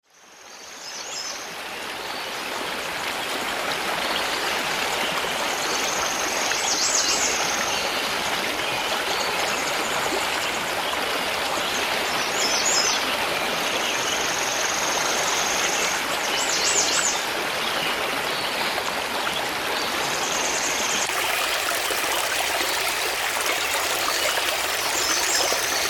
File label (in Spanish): SONIDO DE RIO; ariari el rio de oro